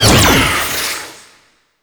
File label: Stalker Scythe Proj Whizz; Stalker Jade Scythe Proj Whizz; Warframe; Weapons